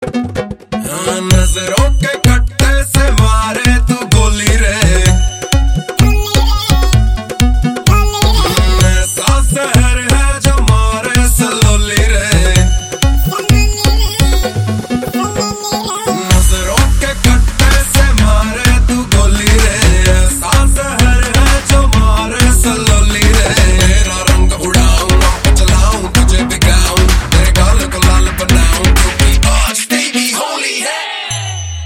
Ringtone; Jolly LLB 2 Movie

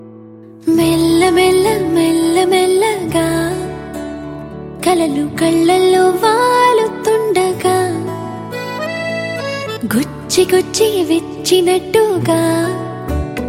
Female Version Ringtone; Telugu Ringtones; 44 Sec; from Size Zero Movie

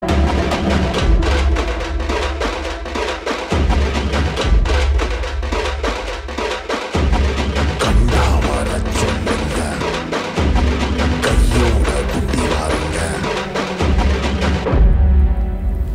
Vocal Drum Ringtone; Dhanush Karnan

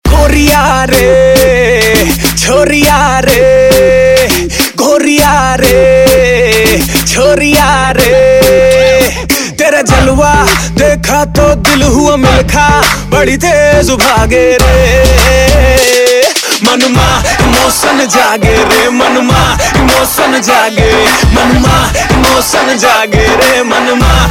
Ringtone; Dilwale Movie

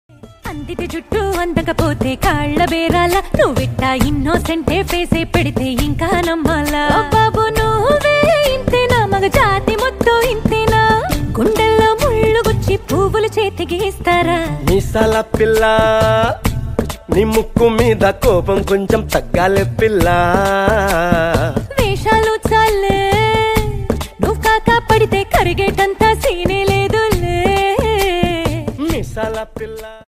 Female Version; Ringtone; Mana Shankara Varaprasad Garu

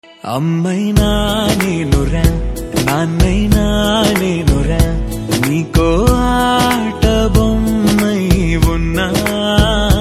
Ringtone; Raja The Great Movie; Telugu Ringtones

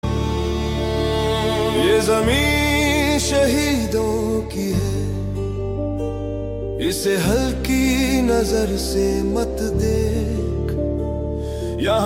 Patriotic Song Music; Border 2

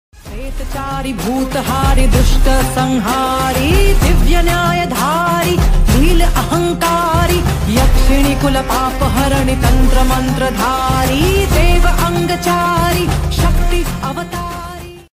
Hindi ringtone BGM; Jatadhara Movie; Sudheer Babu; Sonakshi Sinha; Prerna Arora

